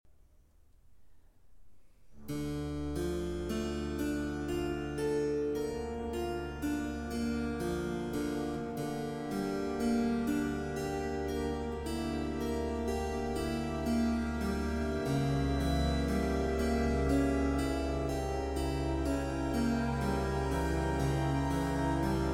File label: Violin Concerto in F Major, Autumn, Adagio molto